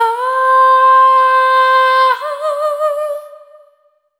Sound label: Single Voice Melodic Loops, Vocal Samples; Pocket Diva World Class Vocal Samples